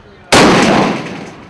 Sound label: Shot Gun